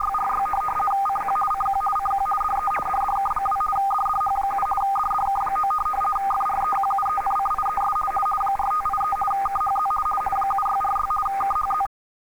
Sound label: rtty usb; fsk demodulator